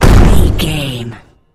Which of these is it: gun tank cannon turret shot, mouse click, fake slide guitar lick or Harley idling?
gun tank cannon turret shot